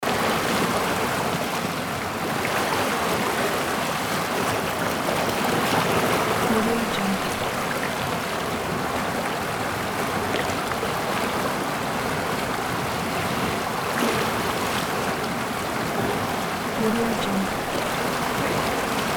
forest river stream

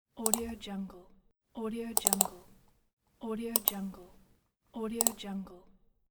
mouse clicks

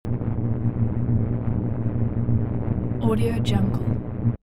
sci-fi space background noise rough

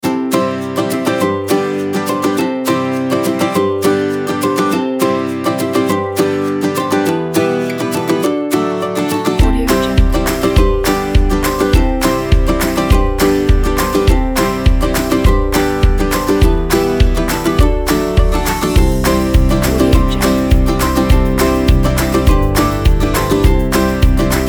acoustic background

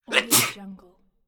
man sneeze

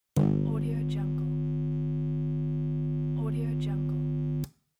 switching on a broken amplifier

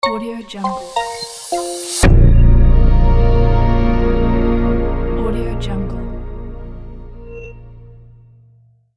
brand logo intro

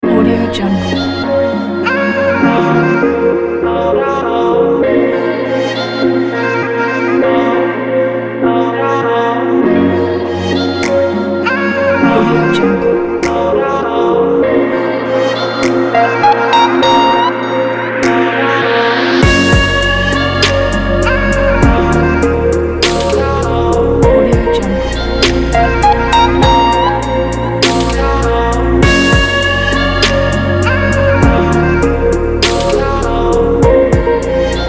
sexy atmospheric hiphop

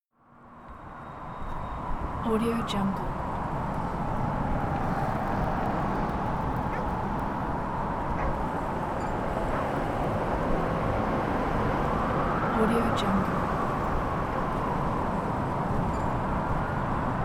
winter suburb ambience

